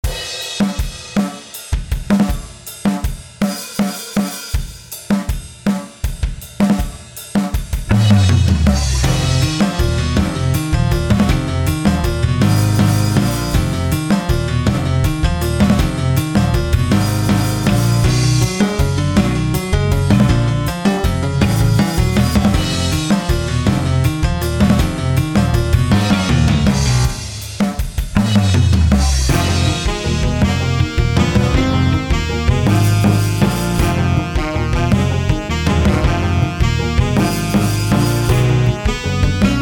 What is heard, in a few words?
intro to prechorus